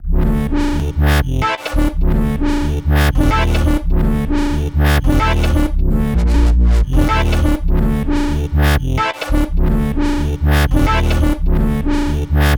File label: Full Mix, G, Complex Electro Synths; Adversity; Prime Loops